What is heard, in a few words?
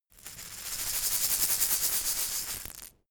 insect shaker rattle dynamic